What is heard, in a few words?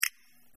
button; Delicious Emilys Childhood Memories